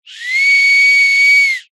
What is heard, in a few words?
whistle blow light; foley; zapsplat